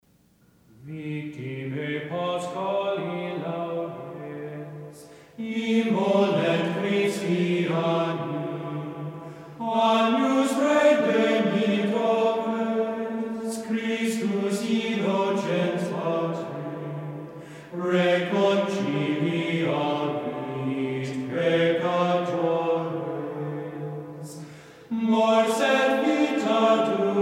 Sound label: CHANT Victimae; StAgnes